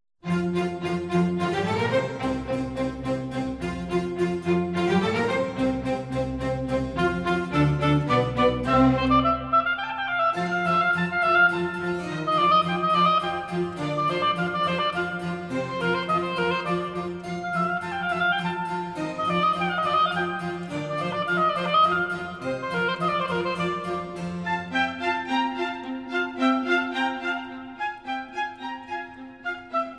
Oboe Concerto In F, Allegro Giusto

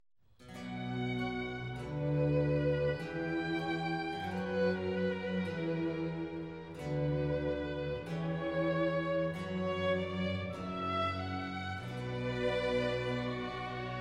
Trumpet Concerto In D; Mvt. 3. Grave

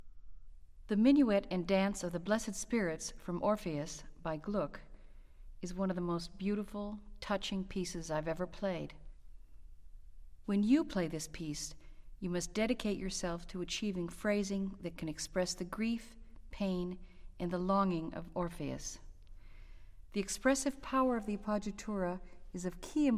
Orchestral Excerpts For Flute

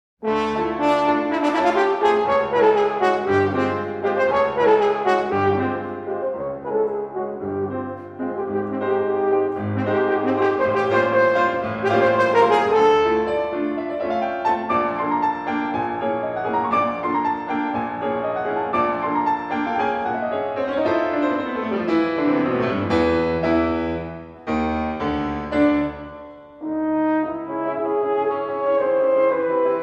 Sonata for Horn and Piano - I. Allegro

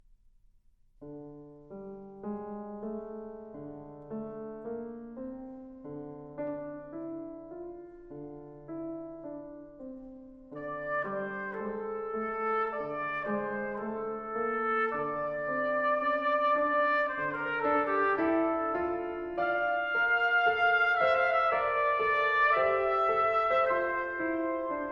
Vocalise, Trumpet Vocalise

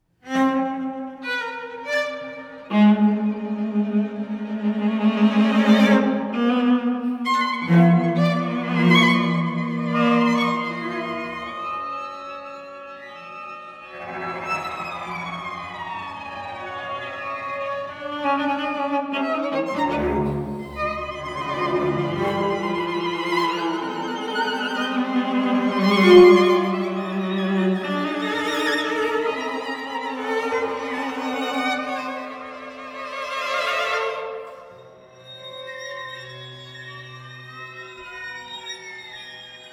String Quartet, Intensivo

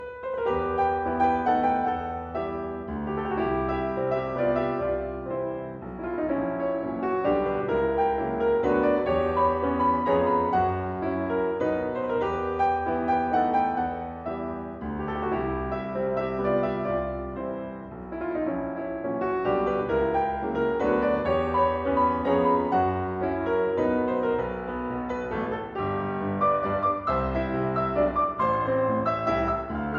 Waltzes, For Piano, four Hands, E Minor; Brahms

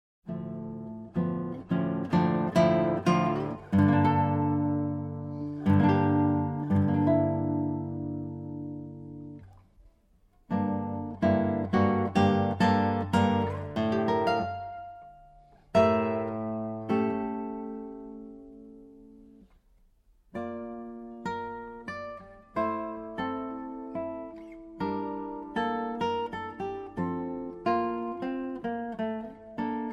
Guitar; Castelnuovo-Tedesco; 24 Caprichos De Goya

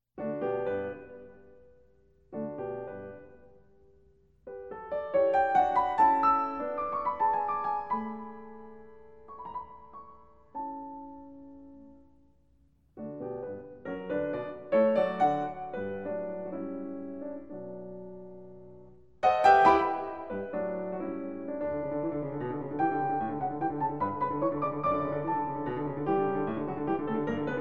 Piano Sonata In D Major, Rondo, Allegro; Caio Pagano; Beethoven

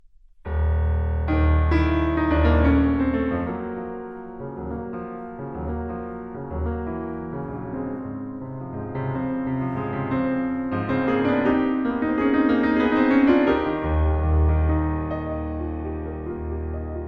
Piano Sonata Poco allegro; Martinů

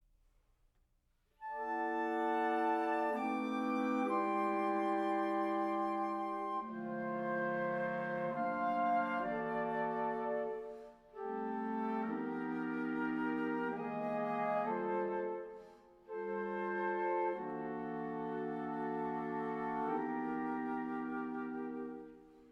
Concerto For Timpani Rubato

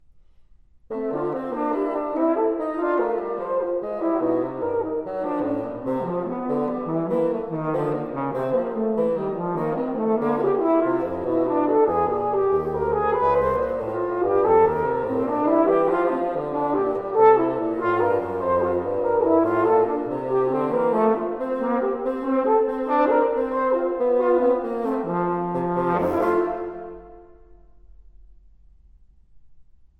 Duets For Horn & Bassoon; Twelve Duets For Horn & Bassoon - IX.

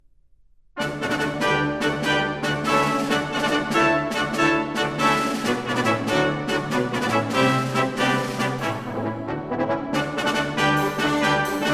Symphony In Brass; Mvt. 3. Allegro Vivace

